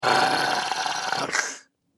Orc Throaty Noise; Monster Sound Effects; horror sound effects

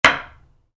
Wood hit concrete, Wood, Light Impact: Concrete; Materials; Stuart Duffield

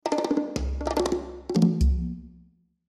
Percussion Ethnic; Percussion Ethnic Rhythm Swirl; Hits; Blastwave

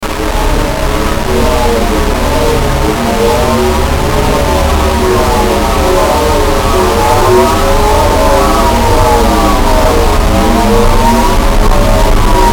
Bed Short Distortion Flange; Bed Sound Effects

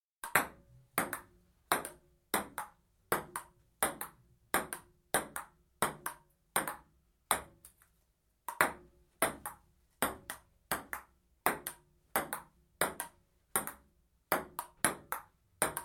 Table Tennis, amateurs playing Ping Pong; Miscellaneous Sport; Miscellaneous Sports; BBC

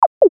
CLICK DOUBLE SMOOTH; Clicks; Blastwave

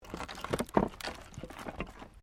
Wood Movement Minor

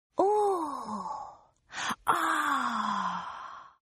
Oooh Gasp Ahhh Female; Gasping; Sound Effects:Voice

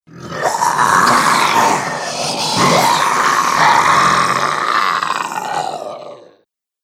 Zombie Mob; horror; Zomby Sound Effects

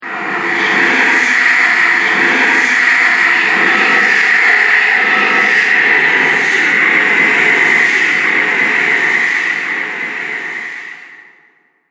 Evil Engine, horror; The Dark Truth; Scary Soundscape Sound Effects

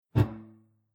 Low Tube Whoosh

